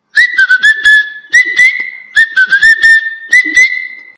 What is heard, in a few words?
Alert